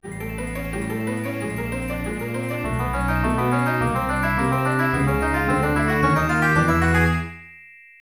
Open Treasure Box; Zelda - Ocarina of Time

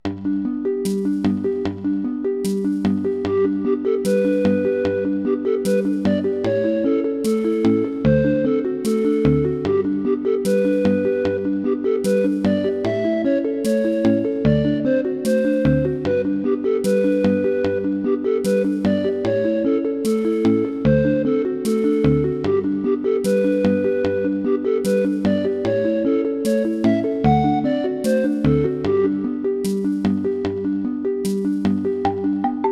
Crystal Caves Rotating Room; Donkey Kong

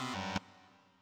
Wrong; Banjo Tooie

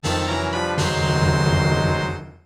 Item Catch; Nintendo 64; Legend of Zelda, The - Ocarina of Time

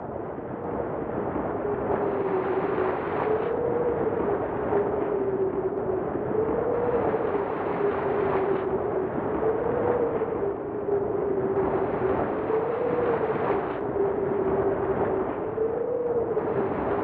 Ending Ambience; Super Nintendo; Donkey Kong Country 2

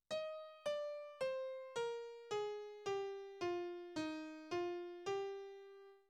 Piano Minigame; Final Fantasy; PS1 + PS2

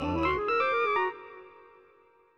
Collect Cheato Page; Banjo-Tooie

